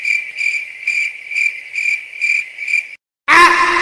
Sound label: awkward silence crickets chirping; meme